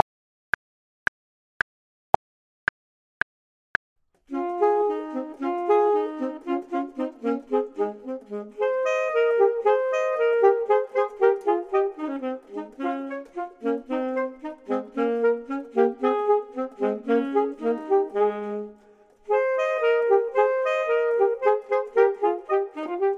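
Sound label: both, Saxophone and Clarinet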